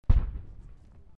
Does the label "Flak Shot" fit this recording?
Yes